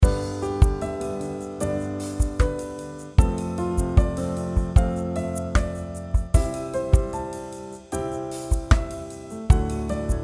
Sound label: harm variation; develop your musical ideas